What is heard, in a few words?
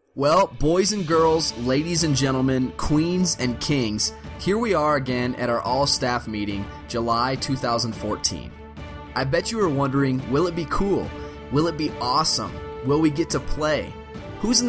music